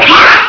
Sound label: jump; alienarena